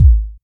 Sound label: tight lows kick sample a key; kick drum samples